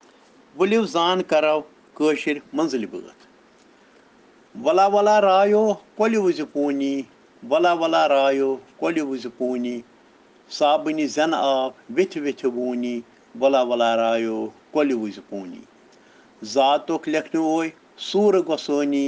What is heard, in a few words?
Children Rhyms in Kashmiri